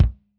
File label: K1 close Kick, drum